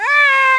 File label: Hit; Mutant Dungeon VR